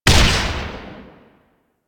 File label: Board Sword Explosion; Sundial